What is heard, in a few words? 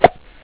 pop